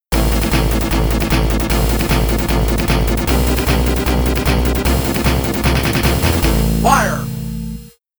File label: Monster Stage Multi-ball Start